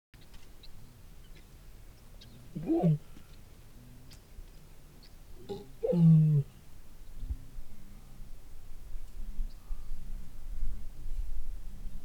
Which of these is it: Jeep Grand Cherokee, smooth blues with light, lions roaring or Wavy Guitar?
lions roaring